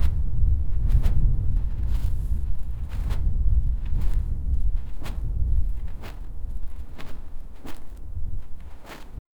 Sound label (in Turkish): ayak sesleri step tap tap; metal